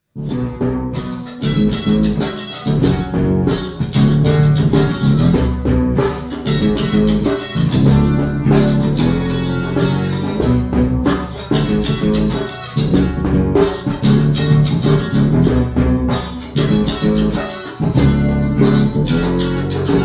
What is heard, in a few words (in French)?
California Rythmique Solo